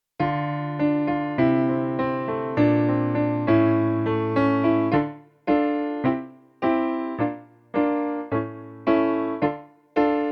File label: backing track; ks1 musical storytelling